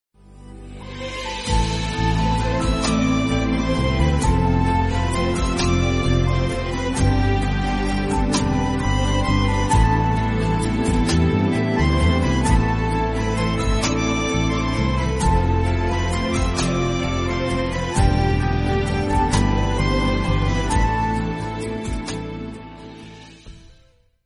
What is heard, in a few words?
Romantic Tune; Naagin